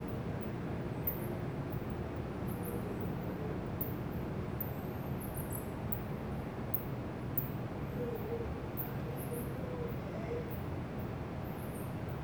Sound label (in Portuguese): Morcegos a noite com transito longe, as vezes cachorro e pessoas